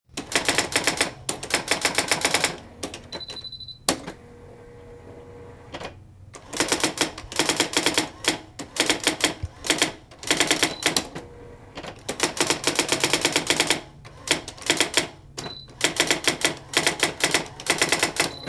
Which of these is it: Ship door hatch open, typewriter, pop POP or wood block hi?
typewriter